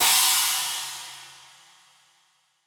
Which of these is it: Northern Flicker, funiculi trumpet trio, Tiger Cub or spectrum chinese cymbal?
spectrum chinese cymbal